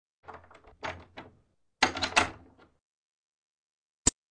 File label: Track, Doors; 헐리웃특수효과음; 문 소리